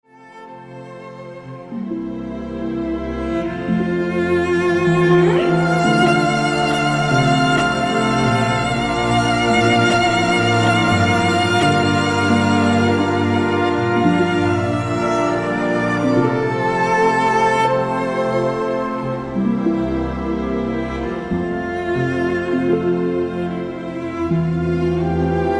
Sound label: D